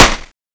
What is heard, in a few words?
mac10 unsil